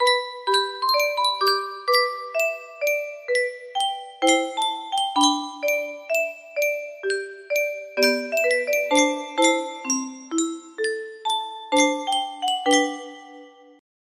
music box

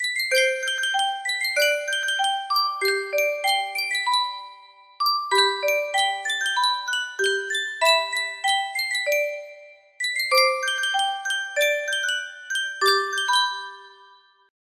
Sankyo music box, music box melody

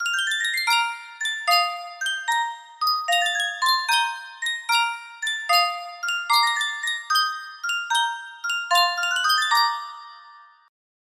sankyo miniature music box, music box melody

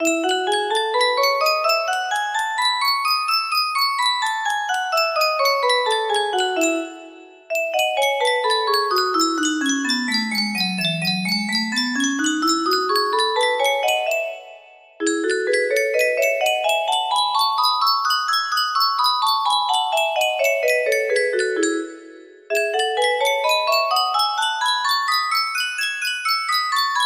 e major, melody

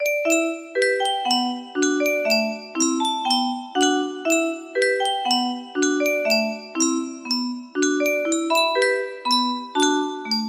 melody, music box

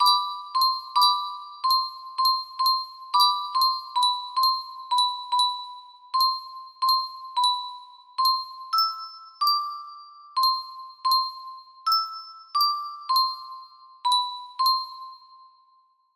music box short cover

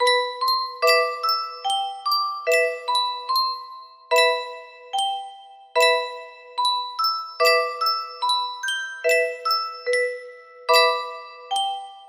melody, music box

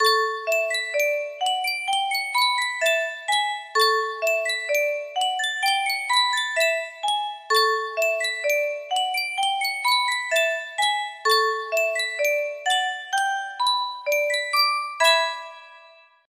yunsheng music box, music box melody

